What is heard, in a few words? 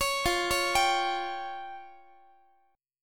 F Suspended 2nd Sharp 5th F down Guitar; Fsus2#5 arpeggiated; JGuitar